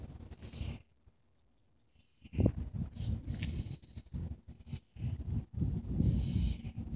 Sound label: weird bird; berkeley bowl